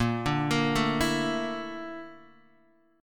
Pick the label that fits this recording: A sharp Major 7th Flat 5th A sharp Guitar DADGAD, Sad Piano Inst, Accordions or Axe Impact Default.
A sharp Major 7th Flat 5th A sharp Guitar DADGAD